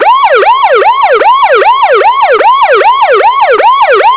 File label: police